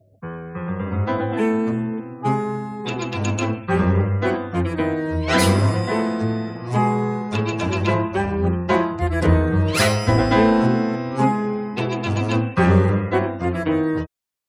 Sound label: synthesized; piazolla